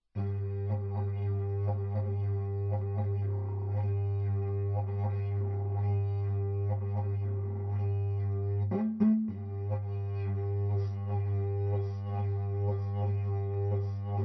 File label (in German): Leimholz Kastendidgeridoo